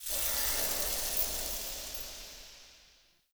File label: UI Action Hold Start; Nightfold